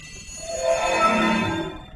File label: Orbing Sound